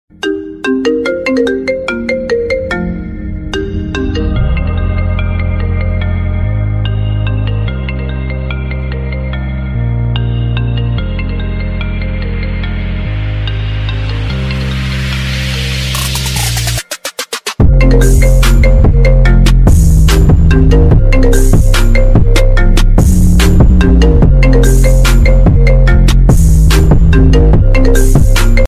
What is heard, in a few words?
Remix; iPhone 12 Pro Max